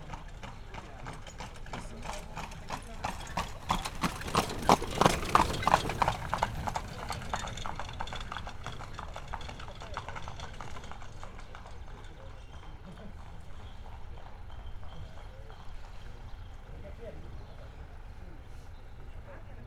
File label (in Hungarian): fogatok és egy autó, ló; Hortobágyi Nemzeti Park 2013; máta